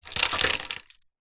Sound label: crumbs cookie sprinkle metal baking tray; food